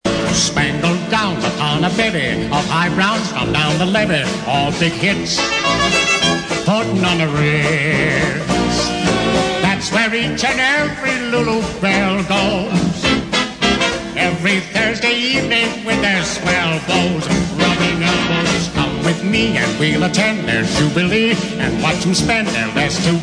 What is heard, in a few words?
vocal